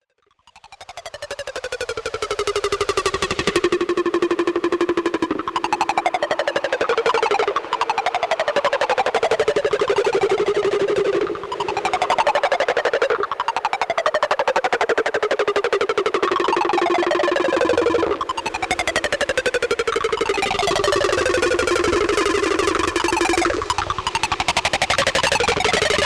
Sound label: Interference Field Transmission